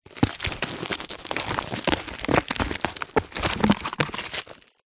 frozen sausages packet bend to break sausages apart; zapsplat